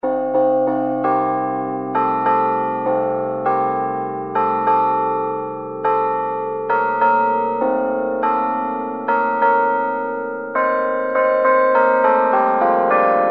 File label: Cast Bronze Bells